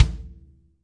yam kick, Multisampled Real Kit; REAL LIVE KITS

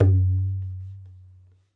Ghe Open, Tabla; ETHNIC and WORLD PERCUSSION; Tabla Kit Large

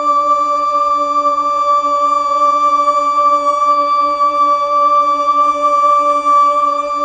Fem D3, Mellotron Female Choir

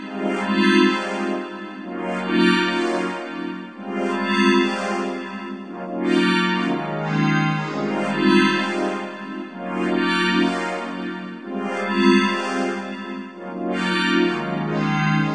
125 electro pad washes; SYNTH AND ELECTRONIC LOOPS

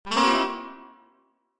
saxophone blurp, Cartoon FX

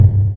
Syn Dr, Overloaded Synthetic Drums; style kits

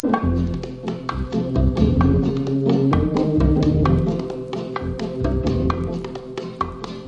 cheese organ music loop

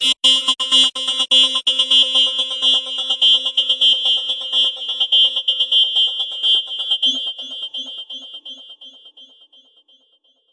126 space banjo; SYNTH AND ELECTRONIC LOOPS

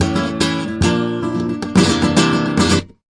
bright flamenco guitar riff; REMIXABLE COLLECTIONS

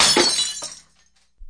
glass break

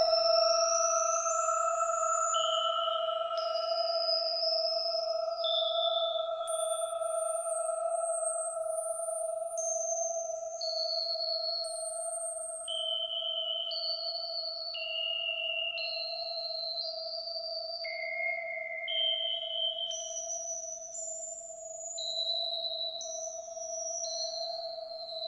spooky waterdrops pad; Pads

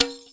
arabic perc, Arabic Percussion Kit

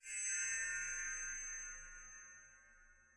dark chime pad; Atmospherics

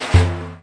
arabic perc, Arabic Percussion Kit; Arabic Percussion Kit 2

